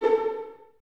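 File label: violins A4 pizzicato, String Ensembles; Miroslav Vitous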